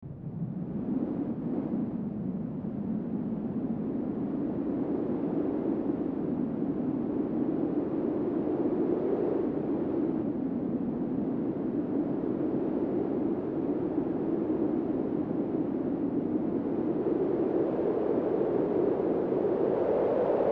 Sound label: wind